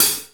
CLOSEHAT, DRUM; BEAT2000

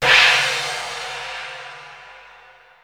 GONG, DRUM; BEAT2000

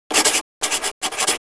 SCRIBBLE

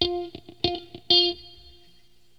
GUITAR, ROCK POP; MAGIX

